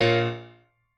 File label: piano